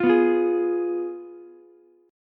F Diminished Ukulele; Fdim strummed; JGuitar

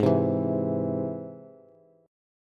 Ab Augmented Ab, Bass; Ab+ strummed; JGuitar

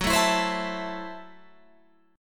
F sharp Minor 7th Flat 5th F sharp Guitar Open F; strummed

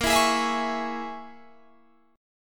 Bb Minor 7th Flat 5th, Guitar, Half Step Down

